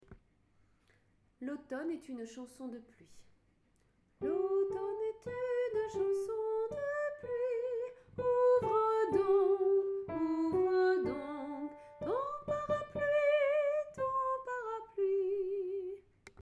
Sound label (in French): L'automne est une chanson de pluie